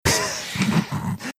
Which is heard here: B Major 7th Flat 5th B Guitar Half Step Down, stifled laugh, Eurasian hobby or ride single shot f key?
stifled laugh